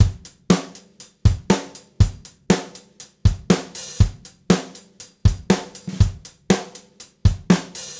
Gretsch groove 120 BPM, 1967 Gretsch Kit drums

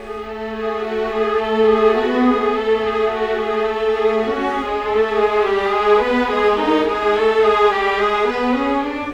Cinematic Viola Violins; Sonic Foundry (Sony Creative Software); Orchestral Series VOL-3 - Cinematic

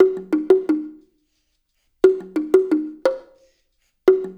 percussion loop; InVision Interactive; Percussion Slam; RULE WORLD

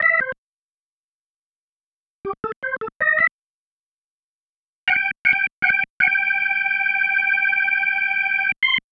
Single Riff, Organ

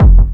KICK PRO, Disco House; USB Soundscan vol.20 - Fresh Disco House I

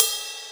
SR16 Ride, Alesis Sr16; Drums

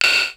PERC MIX; Best Service ProSamples vol.55 - Retro Sampler; AKAI

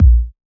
OSH Kick; Big Fish Audio; Old Skool House; OSH Kits; OSH Kicks

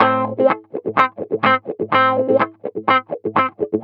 Guitar Wah Loop, 125bpm; Zero-G; Nu Disco

